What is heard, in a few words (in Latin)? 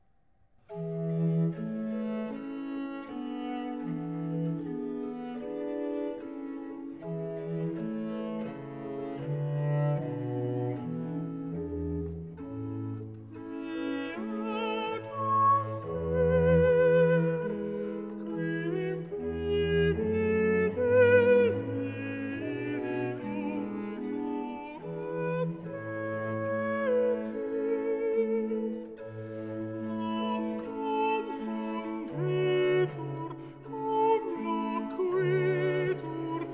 nisi dominus per alto